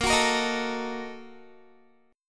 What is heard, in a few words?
Bb Major 7th Suspended 4th Sharp 5th, Guitar; strummed